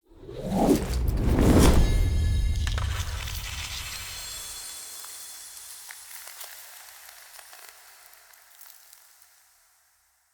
Map Tree Spear Activate

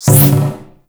Build, UI; Dojo

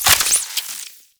Flechette Reload; Weapons; Warframe; Tenno; Oraxia

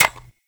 Bird Three Single Step; Warframe; Entrati

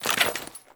Generic Foley; Warframe; Dagath

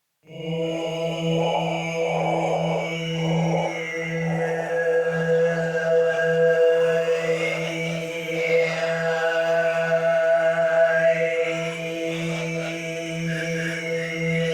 Choir; Warframe; Sentients

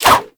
Sword And Whip Whoosh; Warframe; Weapons

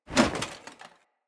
Foley Action Armour Melee Heavy Swipe; Player; Deora Armour

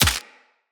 Bullet Impact Head Hit Notification; Weapons

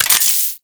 Modular Primary Handle Reload, Guns; Warframe; SU Modular Guns; Reloads

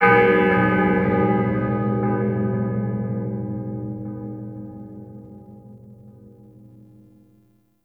Amphitheatre Bell; Ambience; Props; Warframe; Duviri

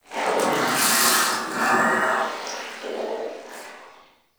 Digest Interior Small, Ambience; Warframe; Sentient Lair

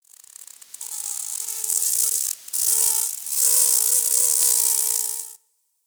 Forest Close Insect Buzz Close, Ambience; Warframe; Grineer

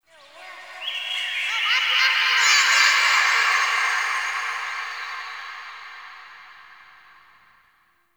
Park Memory Echoes; Warframe; The New War; Zariman

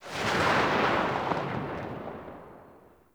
Bayonet Tail, Weapons; Warframe; Tenno

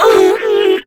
Death, Female; Warframe; Grineer Female; Battle Barks

